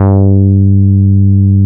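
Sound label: NORD F G3, bass; NORDY BS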